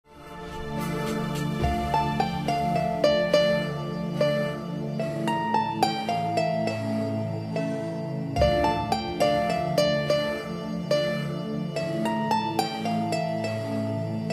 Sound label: Mandoline Concerto